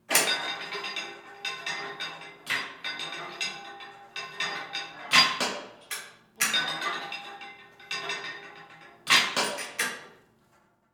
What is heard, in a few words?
SFX bench press machine several reps